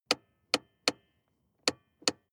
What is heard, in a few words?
sports car int handbrake pull long; verkehr-transport